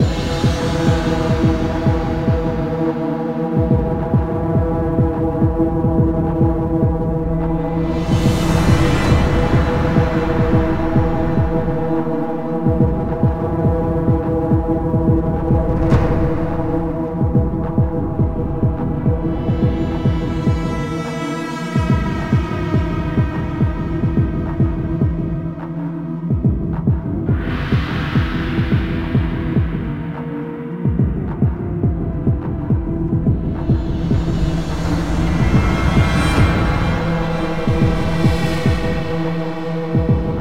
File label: Police Station Radio Inside Far